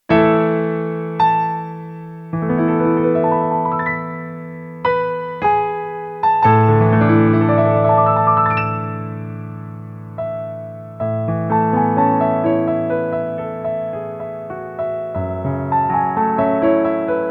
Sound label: Contemporary Bridal March